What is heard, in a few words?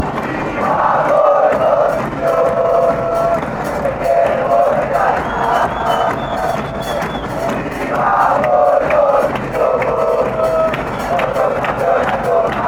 millonarios chant; mfc